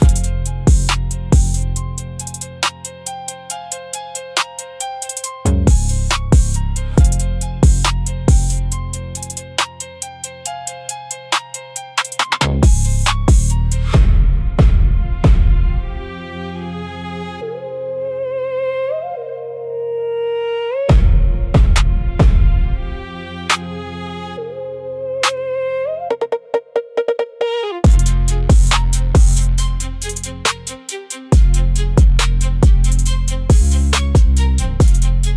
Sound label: Beats